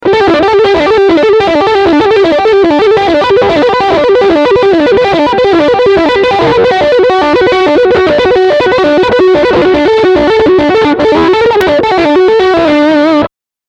Tappinglinie beide Pickups

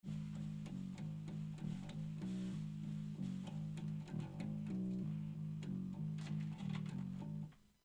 Groove Bass ohne Ear Box

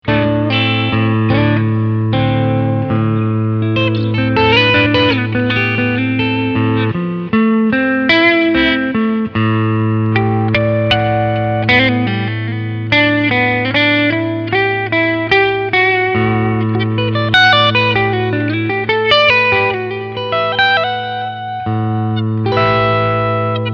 Clean Picking beide HB

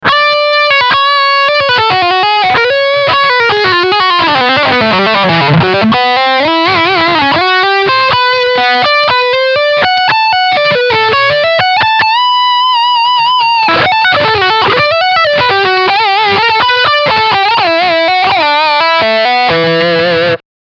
Leadsound Steg Humbucker

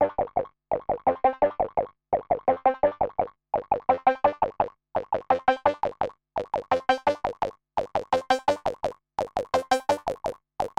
Korg MS20 Sequenz 303ish

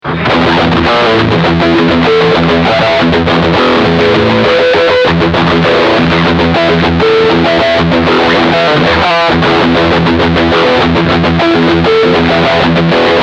Steg Humbucker Riff

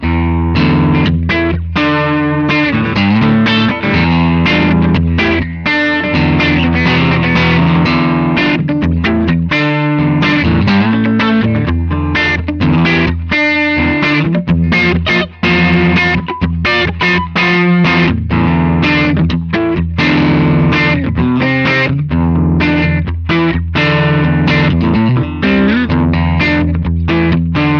crunch bridge hh und neck singlecoil

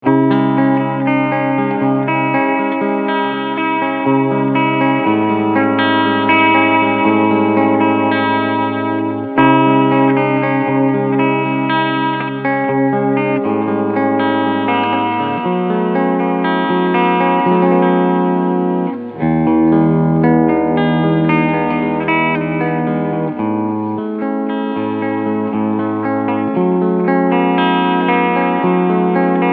Cleansound Steg PU

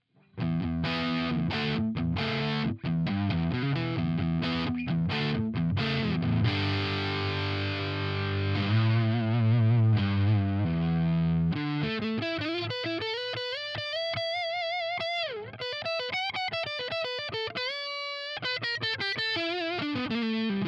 Harley Benton CST 24HB dist hals

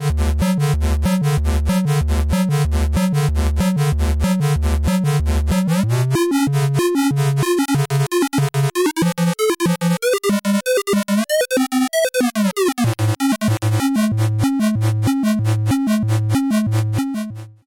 Erica Synths Pico System 3 VCO1 als LFO für Shape CV

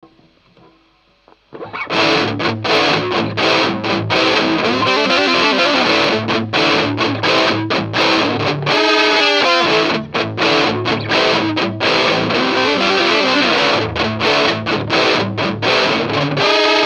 Cort Hals Pickups verzerrt

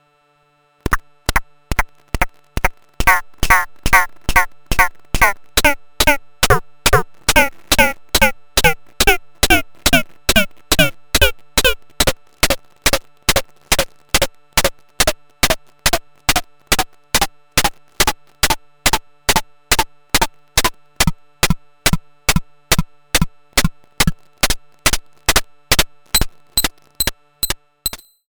Error Instruments Drums Error Drum Presets mit kurzem Decay

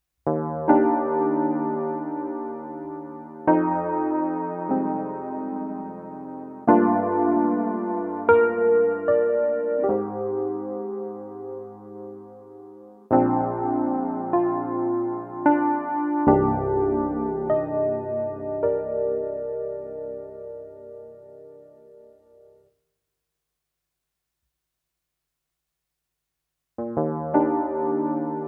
Thomann SP-320 E-Piano

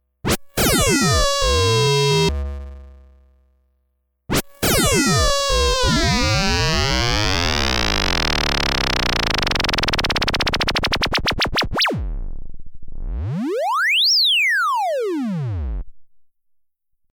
FX Klang

